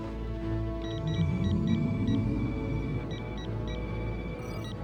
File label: Power Up; Runabout